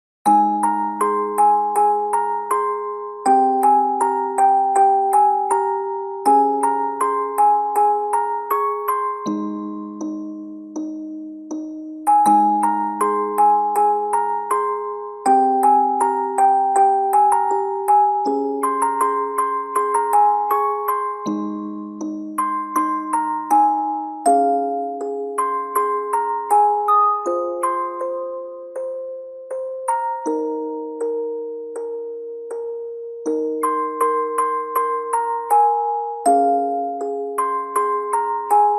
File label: MusicBox